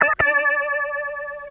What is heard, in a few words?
D, Guitars; Total Drum&Bass; 90 s Sample CDs